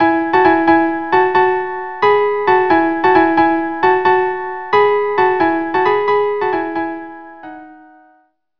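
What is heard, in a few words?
violin viola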